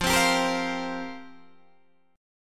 F Suspended 2nd Suspended 4th F down Guitar Open D